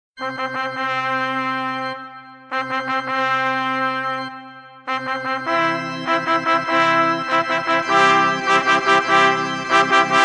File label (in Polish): marsz weselny, 3 trąbki; mendelssohna